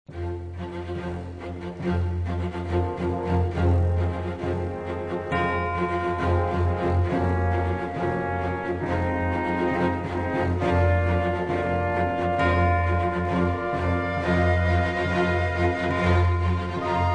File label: Majesty Strings, Christmas